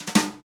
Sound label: New Brush Snare Phrase; Snares